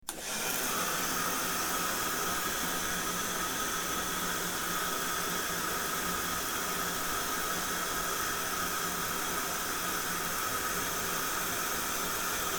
shower

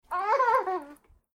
baby laughing

